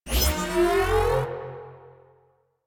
Alt Charge; Warframe; Weapons; Corpus; Plinx

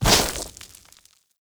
Ram Movement Start; Animals; Duviri

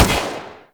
Rifle Shot; Warframe; Tenno; Tenno Bard Rifle

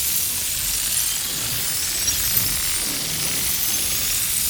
Tenno Revive Riser Loop; Warframe

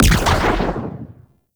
fire trail teleport boom; Warframe; Nezha

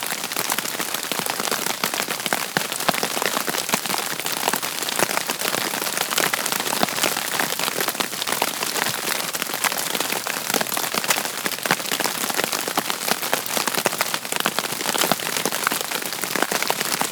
Rain On Suit Loop; Warframe; Grineer Shipyards; Exterior

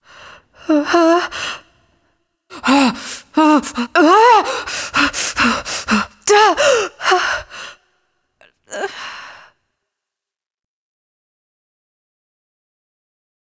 Exertions Void Hole; Cinematics; Warframe; TheNewWar; Throne Room

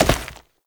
Step Rock, Foley; Warframe; Oraxia

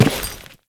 Step Default, Footsteps; Alad V

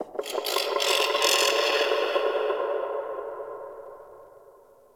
Venus Landscape Cave Creature, Ambience; Warframe; Venus Open World

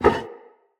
Vase Medium Impact; Vases

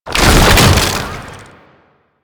Run Start Side, Foley; Enemies; Warframe; Proto Infested; Babau

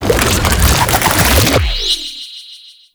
Treasure Box Proj Charge; Warframe; ManInTheWall

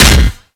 Impact Metal, Weapons; Warframe; Furax; Impacts

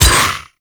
Melee Hit; Warframe; Voruna

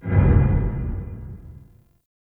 Low Mech; Warframe; Ambience; Nokko Colony

